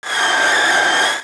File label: skid low friction, vehicles lambo2